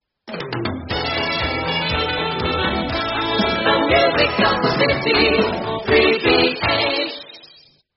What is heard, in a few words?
jingle; australian radio 3ba